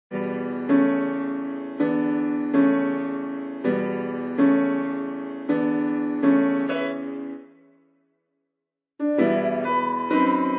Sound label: God Rest Ye Merry Gentleman Jazzy